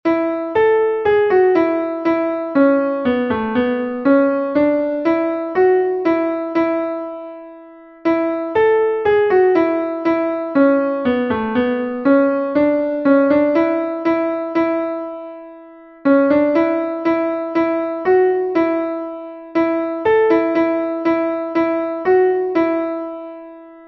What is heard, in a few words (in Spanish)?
CONTRALTO, himnos en piano; cantos del camino